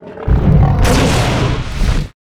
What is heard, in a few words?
Ancient Dragon, monster